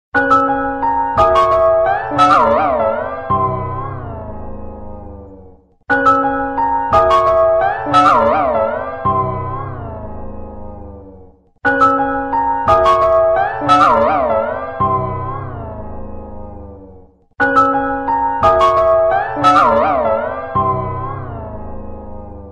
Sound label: Nokia Distort, Ringtones